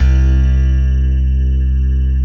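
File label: grand piano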